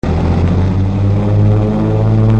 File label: Light Plane Take Off; Machines